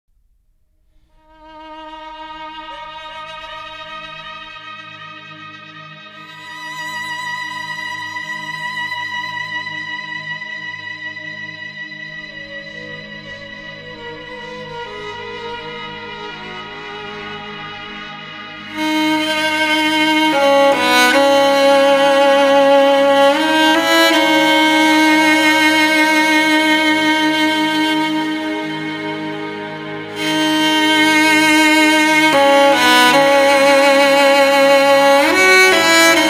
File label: cello solo